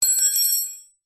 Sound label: industrial wrench spanner metal small drop garage floor; zapsplat